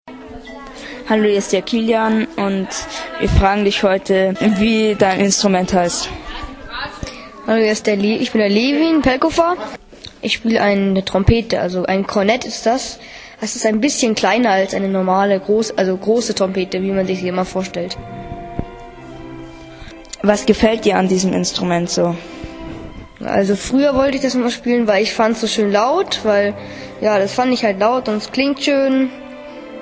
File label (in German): Trompete; Hier hört ihr ein Interview mit dem Trompeter.; culture kids